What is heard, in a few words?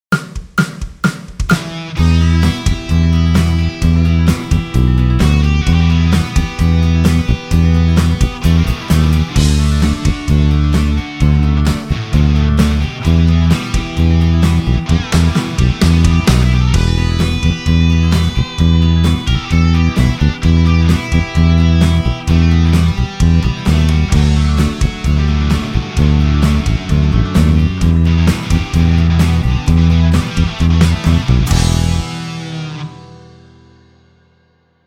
130 BPM 16 bar Rock